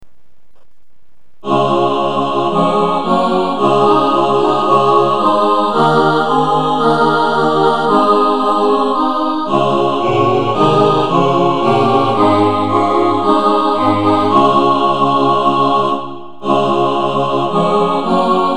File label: ubers gebirg ssatb, choir